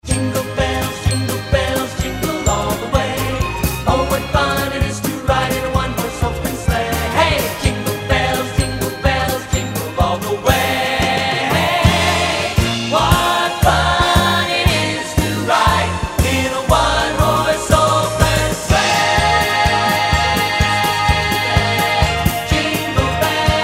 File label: Jingle Bells